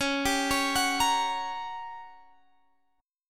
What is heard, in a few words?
G Minor Major 7th Flat 5th C sharp